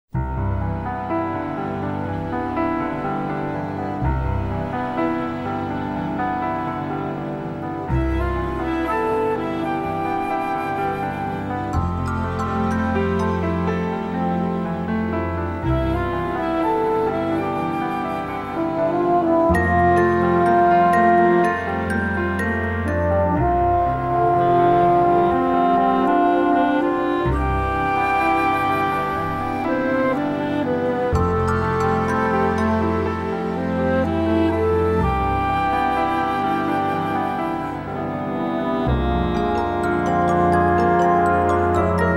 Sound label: curtain music